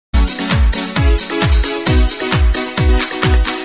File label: Loop